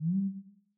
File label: woop